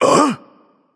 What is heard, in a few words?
nv surprised, vox; smite; voicepack; vulcan